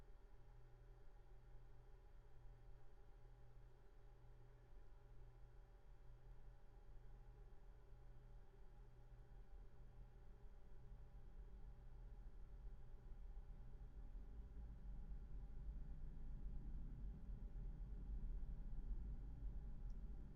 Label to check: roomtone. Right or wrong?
Right